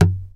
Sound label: WOOD, Bass Library; Sampleheads; Will Lee Bass Library